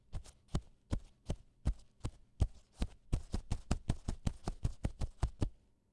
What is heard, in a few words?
Footsteps